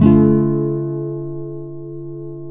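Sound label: nylon guitar down minor D#, flam chords; Spectrasonics; Hans Zimmer Guitars VOL-2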